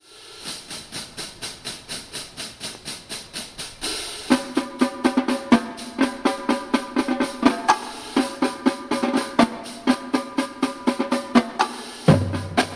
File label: Drumline, marching band